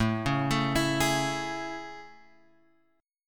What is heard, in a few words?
A Major 7th A, Guitar, Open G